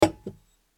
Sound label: Glassware